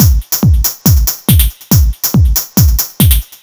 loop A, Techno Drums; TechnoMusicMaker

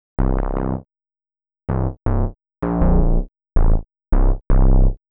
SNTH BASS AMBNT 160 C; Ambient

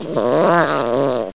LYNX; Wired for Sound Pro CD; Aristosoft, Inc.; funnysnd

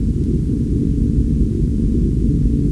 engine

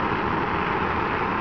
hover; tnpdemo

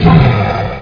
death, demon; Quake

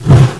torch; Odyssey

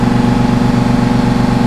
MOTOR, Slot Car

